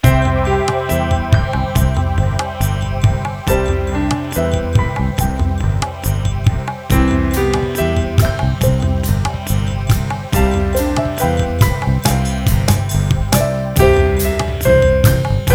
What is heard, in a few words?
Easy Listening Instrumental